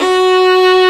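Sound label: Vio legato F#3; Digital Sound Factory Virtuoso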